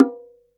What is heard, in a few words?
Bongo L; EMU Orbit-3